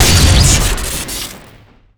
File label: widow maker shot crit; tf2classic